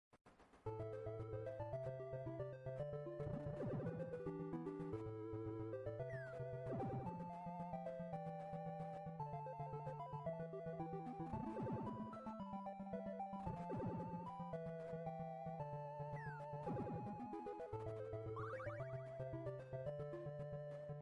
Shinobi game; Zomboid; Video Game Consoles

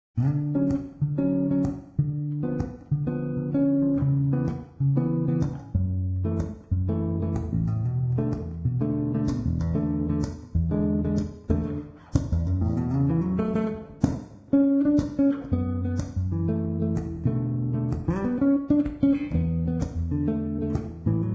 Guitar Electric Bass; Zomboid; Lifestyle

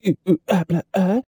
Man Dance Happy; Zomboid; Lifestyle

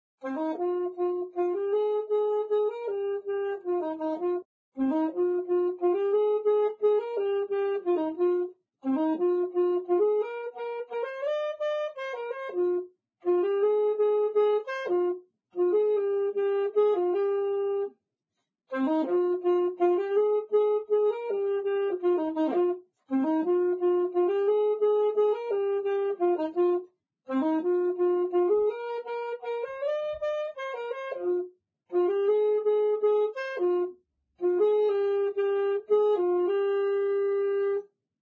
Saxophone; Zomboid; Lifestyle

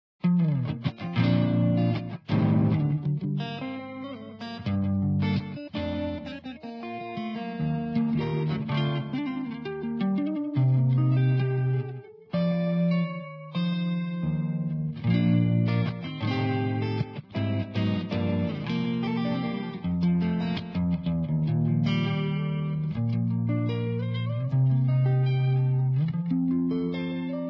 Guitar Electric; Zomboid; Lifestyle

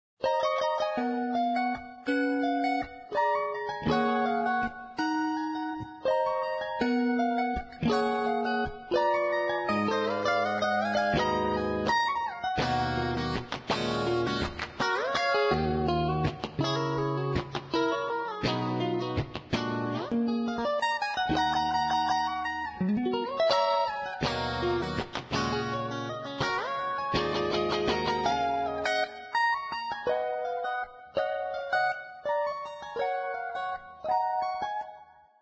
Guitar Electric

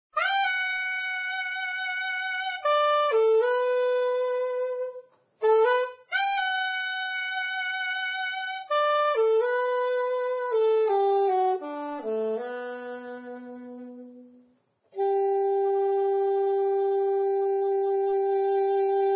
Saxophone; Zomboid; Lifestyle

